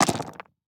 DICE on Neoprene, Throw and Roll, Standard, 16 Sixteen Dice; Samples; ArticulatedSounds; DICE Sfx Party (Extended)